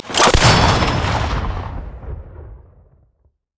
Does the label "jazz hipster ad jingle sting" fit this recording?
No